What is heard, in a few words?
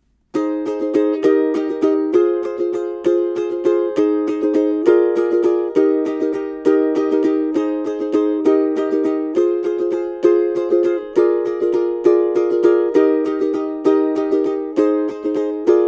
Verse Strum, ukulele